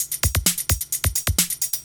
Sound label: 130 trance break, Drum & Perc Loops